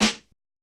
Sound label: snare, lofi hiphop; Legendary lofi hiphop